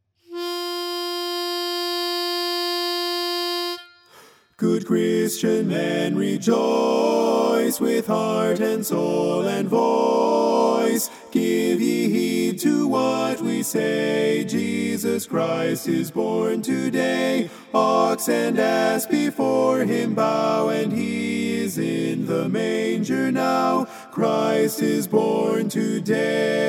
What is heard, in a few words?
BARI, Good Christian Men, Rejoice